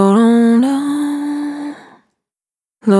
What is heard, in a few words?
Spin Dry, Single Voice Melodic Loops